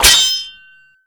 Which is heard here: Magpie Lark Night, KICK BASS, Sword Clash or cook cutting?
Sword Clash